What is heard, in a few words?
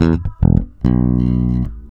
REAL BASS, BASSLICKS; Ueberschall; Jam Box